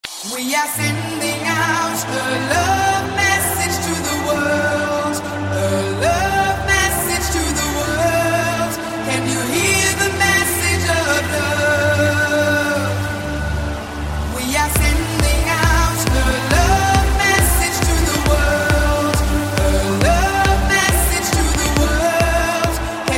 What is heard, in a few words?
edm sms ringtone